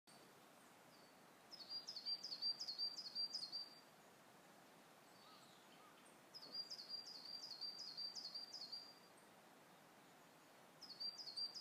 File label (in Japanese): kogara